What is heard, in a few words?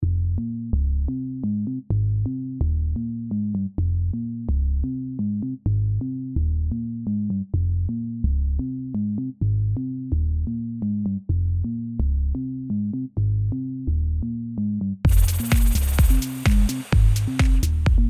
Noise Spray House Mix